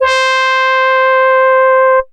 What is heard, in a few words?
Yamaha TX81Z Flugelhorn C6